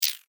multimedia max min interface sound electric min; Multimedia Interface ' Minimise ' Sound ( Electric Style Tone ) Ideal For Minimising Of Br; Tones; Multimedia ’Minimize’ Sound; Sound Design; McKinneySound

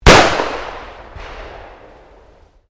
SHOTGUN 12 GAUGE FIRING, Sporting Shotguns; Shotgun Sound Effects

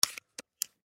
Cell Battery, Cell Telephone Battery Remove; Cell Phones; Technology; Blastwave

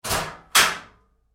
Door Locker Open Roomy Hard, Metal Door; Doors; Big Room Sound

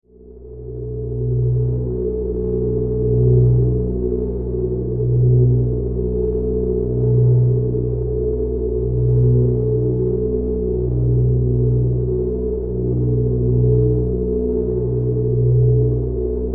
Spaceship, Spaceship: Ambience

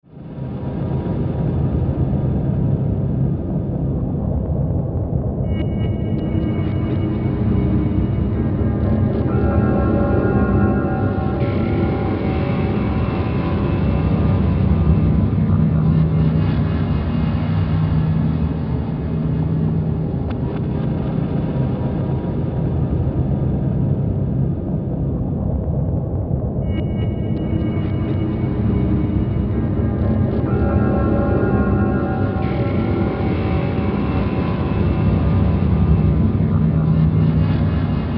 Bed Ring Metallic Reverb Deep Eerie; Bed Sound Effects

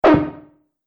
Multimedia Button

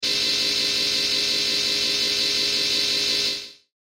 Sci Fi Laser Beam Ray Stream; Lasers

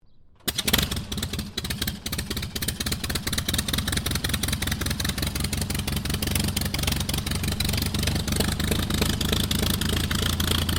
AIRCRAFT DH82A DEHAVILAND TIGERMOTH STARTUP IDLE; RAAF Tigermoth; Airplanes (Sound Effects:Vehicles)

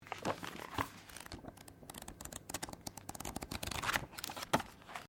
Book Page Flick; Book movement; Books (Sound Effects:Offices)

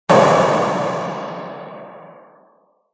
Smash Hit; Multimedia

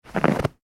human footstep snow; Single Footstep On Snow; McKinney Sound

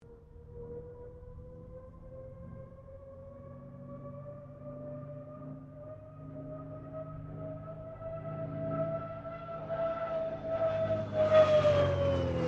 AIRCRAFT CA-13 BOOMERANG PASS

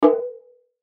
Chime Hit Drum, Chime Hit Bell Short Bright; Chime Hit Bell Short Bright Production Element Imaging Element Accent Transition; Multimedia; Chimes; Blastwave

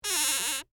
Door Squeak Wood, Foley, Door, Squeak, Wood; BlastWave

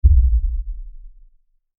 LFE Hit, Lfe Hit Short Impact; Hit Filter Warble Production Element Imaging Element Accent Transition

